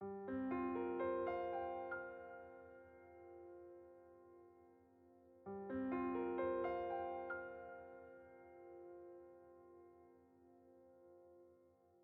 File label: Env Sky Low Gravity